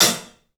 HAT, CLUBHAT, 3D Drums; 3D Sound

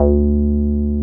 303 SQR D3 MP, SQUARE BS

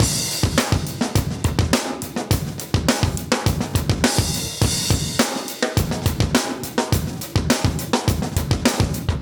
104 live breaks

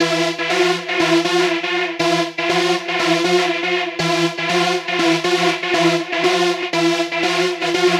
Trance Synth

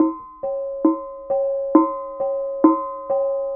KENONG; Spectrasonics; Heart of Asia; KENONG PH

